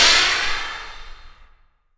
FX Downlifter

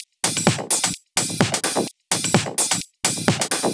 House Loop, 128 BPM; Vengeance Samples

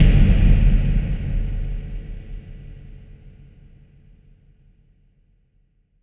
Hits & Explode

